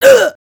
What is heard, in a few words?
minifig damage; aronwk collection; ndaudio